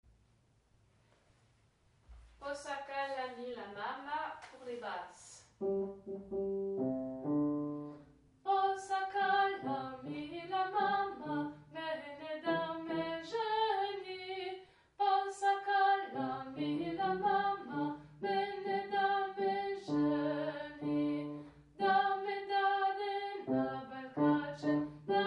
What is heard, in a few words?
posakala mila mama basses